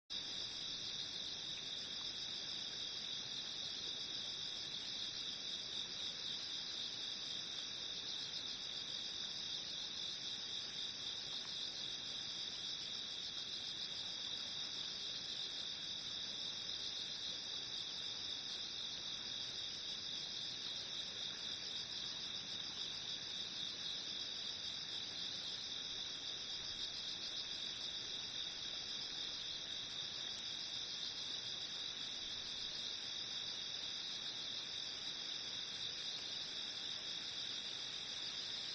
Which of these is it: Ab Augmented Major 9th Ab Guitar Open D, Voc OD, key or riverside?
riverside